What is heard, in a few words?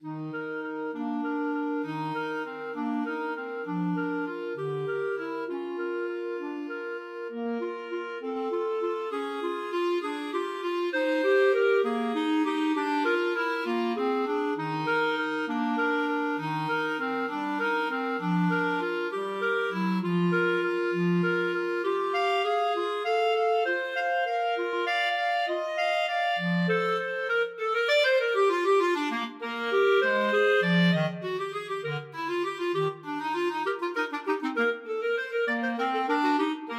waltz, clarinet duet